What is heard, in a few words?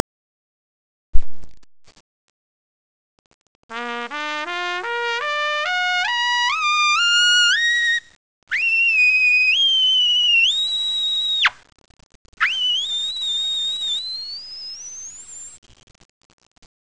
trumpet